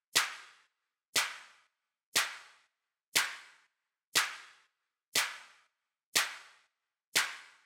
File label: Clap loop, Big EDM Drum Loops 120 BPM; Future House; Future House Tools